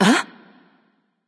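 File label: surprised, female; smite; voicepack; hera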